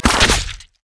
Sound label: basicattack; jinx base